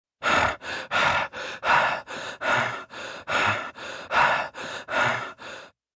Breathing Raspy, Breathing, male breathing, panting, stalking, out of breath; (human voice); VoiceBox